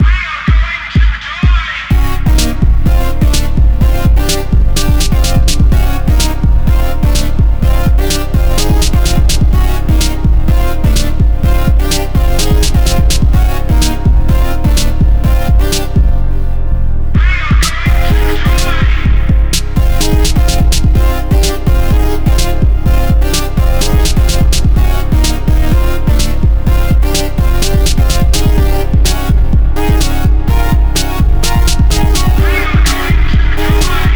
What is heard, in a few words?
instrumental